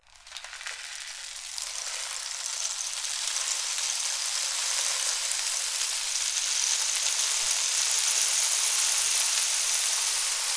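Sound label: rainstick low, percussion; ILIO; Synclavier Percussion 2; World & Orchestral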